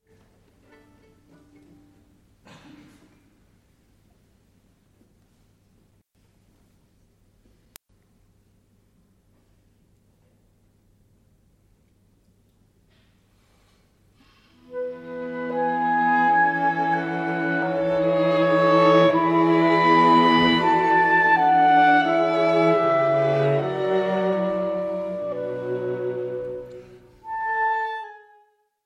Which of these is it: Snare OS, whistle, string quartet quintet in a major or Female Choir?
string quartet quintet in a major